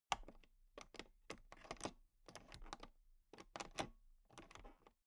toolbox latch clatter soft

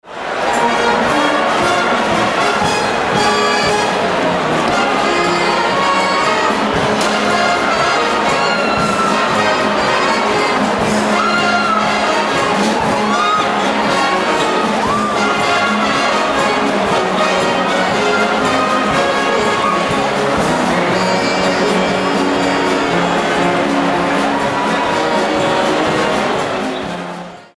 octoberfest atmo

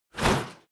scrape

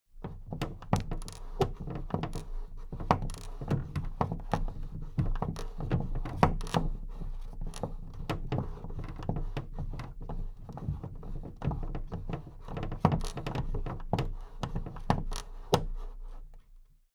big plastic case movement handle rumble

